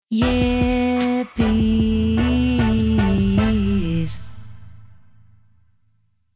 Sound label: Text to Song; Voicemod